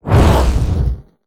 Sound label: land; Garry's Mod; hungry worm 4